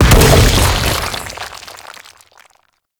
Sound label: Infested Bone Blade Mortar Proj Impact; Warframe